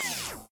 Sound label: shock sucked